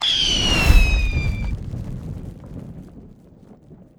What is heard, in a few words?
Fire Elem Inferno Fire; Legend of Keepers